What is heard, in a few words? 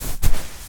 footstep sand walk; SFX; Environment; MONO; ProSoundCollection v1 3